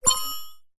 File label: science fiction computer burst noise beep